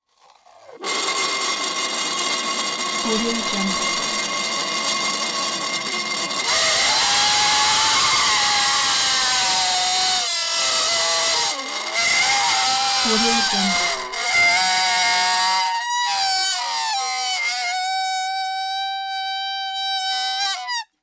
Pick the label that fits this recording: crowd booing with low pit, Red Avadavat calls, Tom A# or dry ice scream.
dry ice scream